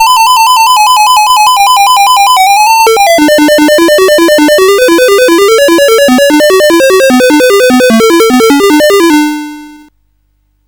sid CSG8580R5, GAMES; Cavelon

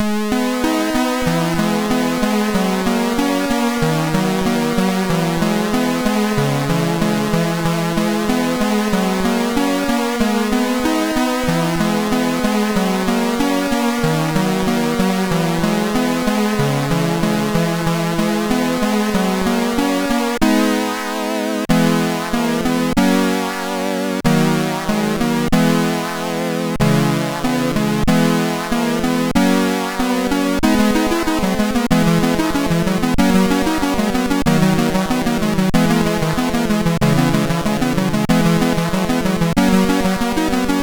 Play Piano, sid MOS6581R2

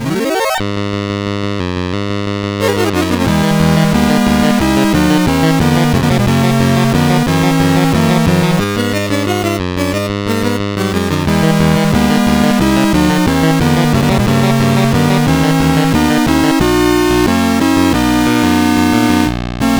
Battle Medley, sid CSG8580R5; Final Fantasy

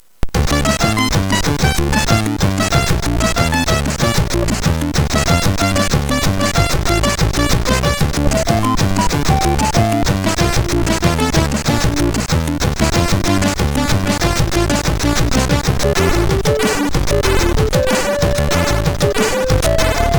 sid MOS6581

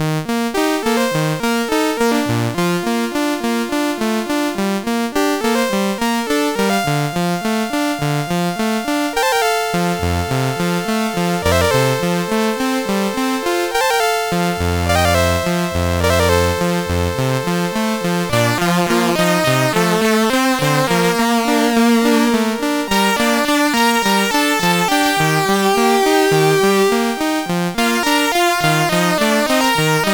CSG8580R5

